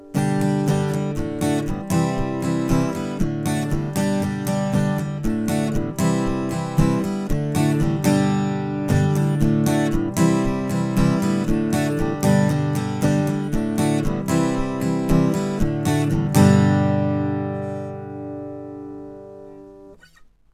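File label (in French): acoustique strumming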